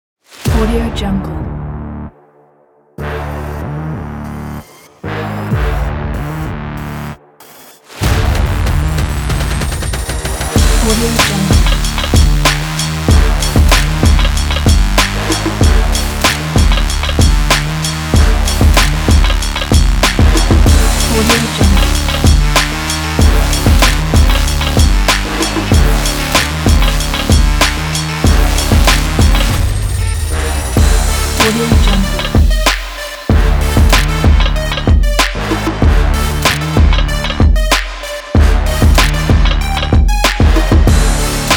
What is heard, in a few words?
hard sport electronic hip hop